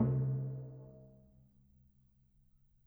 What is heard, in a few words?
Timpani, Orchestral Percussion One Shots